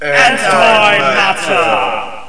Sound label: Antimatter; Soldiers 2